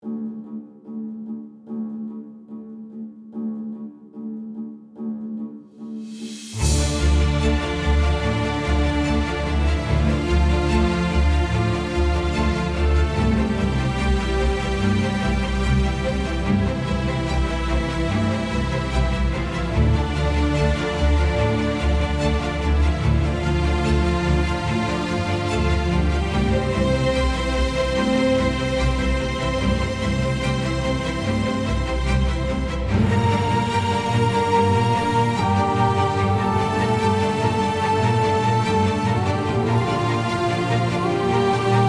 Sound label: Music